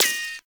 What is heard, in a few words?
Bullet Impact Ricochet; Warframe